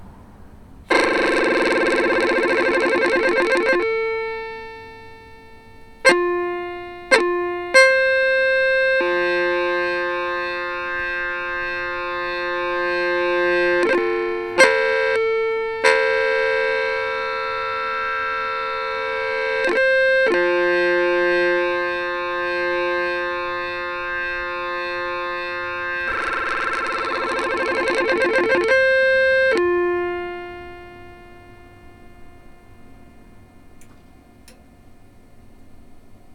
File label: medium pitch analog hd